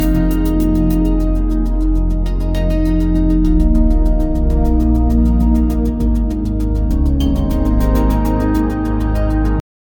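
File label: deep house track featuring, electronic music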